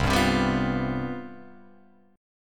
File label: C Major 7th Suspended 4th C down Guitar Open F; strummed